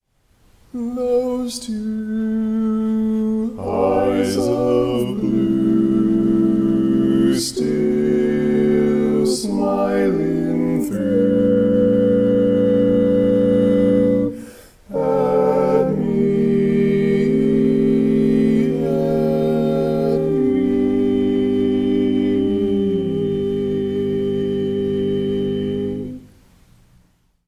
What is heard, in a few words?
barbershop tags, all parts